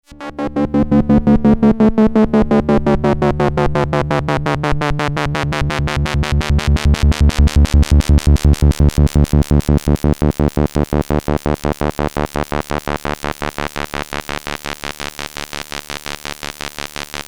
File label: waldorf rocket saw lfo